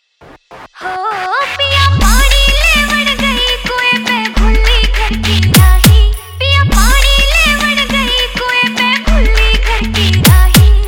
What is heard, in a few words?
Hard Bass Remix Song